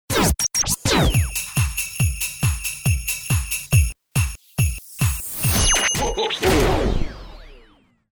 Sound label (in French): Base rythmé